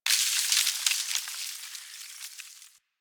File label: Landscape Ice Slide Small, Ambience; Warframe; Venus Open World; One Offs